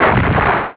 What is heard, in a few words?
menu logo